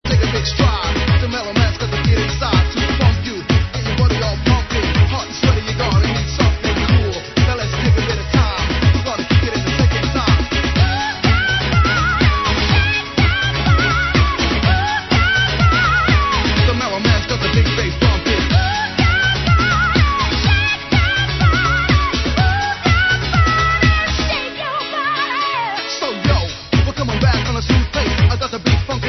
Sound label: get the big bass punch; Punch mix edit; bass bumpers